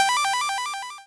New unlock; backpack hero gamerip 2022

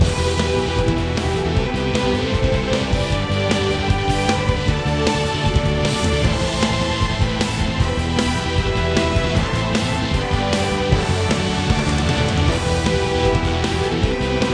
Battle Loop; Tower

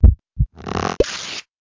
SFX Emoji heartbreak; Golf Blitz 1.12

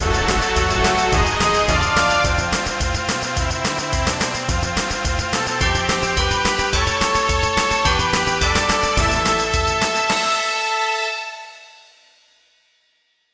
Level E N D; sonic epoch

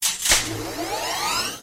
Door Open

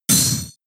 Wide Guard shield hit; pokemon sfx gen 7 attack moves sumo usum

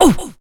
Oof

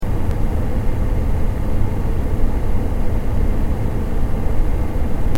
Computer Loop; those nights at rachels gamerip 2015

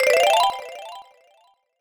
end level

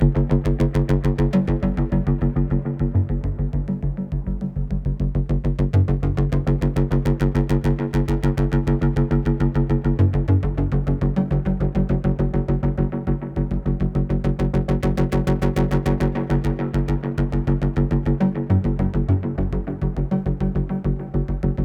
Music Tension Pulse Low Tempo; Battlefield 3

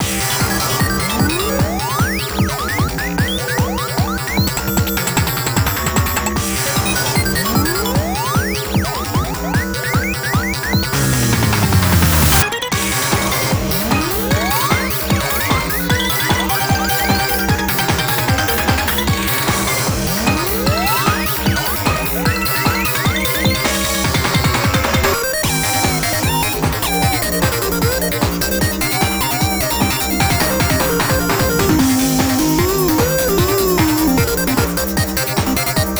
Boss Battle BGM; Jetpack Heroes; LeapFrog Didj

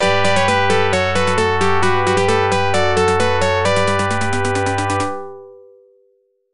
Unused Jingle

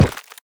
dung ball death

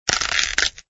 Bend Sound; Extra Lives

